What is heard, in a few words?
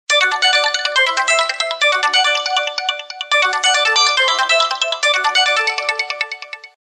Ringtone, Samsung GT-C3510